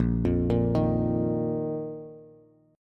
Bb Suspended 4th Bb down Bass; Bbsus4 arpeggiated

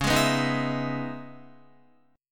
Db Minor 9th Db Guitar; strummed; JGuitar

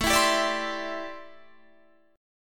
C Major Add 9th, A, guitar chord; strummed

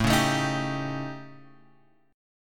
A 7th Flat 5th Guitar Open G

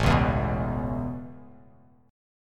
A Major Add 9th A, chord

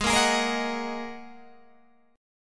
G sharp 7th Suspended 2nd G sharp Guitar Open G

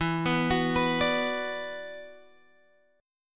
E 7th Suspended 2nd, down, Guitar, Open G

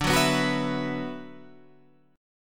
D 6th Add 9th D; D6add9 strummed; 10,9,9,11,12,12; JGuitar

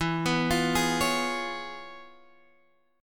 C sharp 7th E; C#7/E; arpeggiated; JGuitar

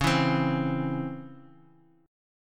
C sharp Minor Major 7th C sharp, chord; strummed; x,16,14,17

